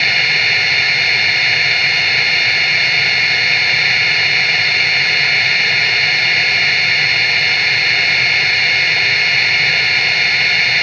stoergeraeusch; fiepen; rauschen und fiepen

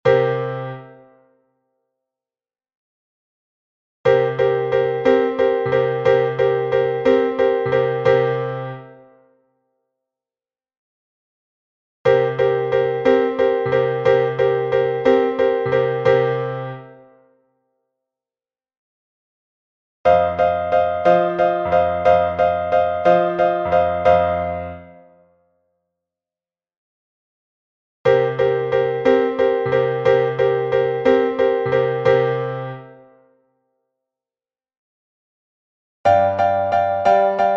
blues geruest

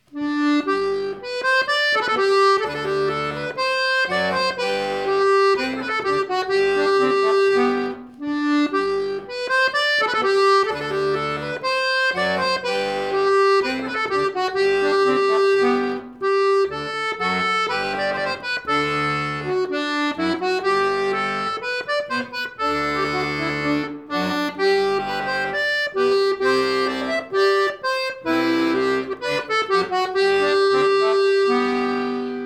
melodie

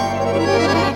glissando, diatonisches Glissando auf Knopf

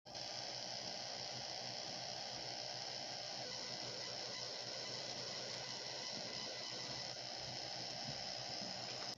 rauschen; kratzen; steinbergur12 pc

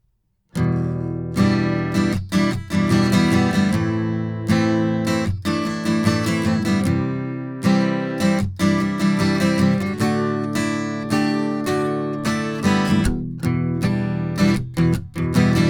shure beta58a lowden s22 diy mikrofonhalter

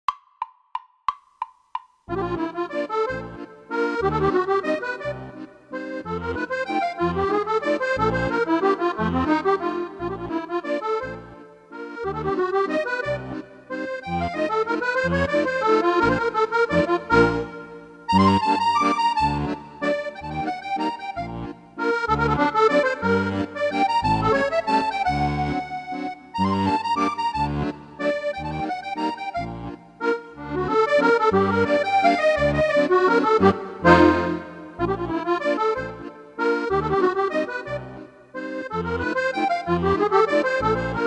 walzer primo 180bpm

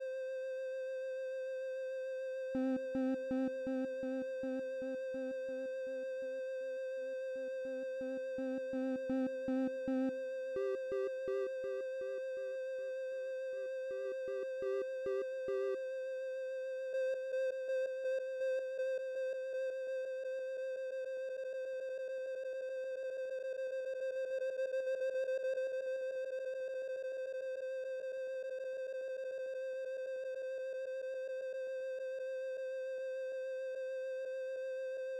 re trigger, Tonrad

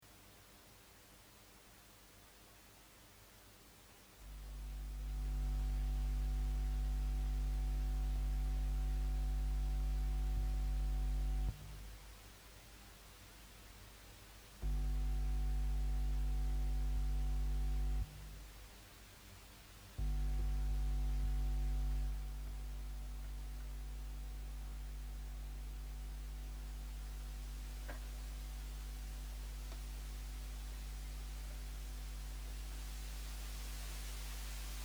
hum noise, westerngitarre pu brummt rauscht; maton ebw70c

